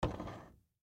Cologne Put Away, Cologne Put Away Bathroom Cupboard; Primping; Human; Household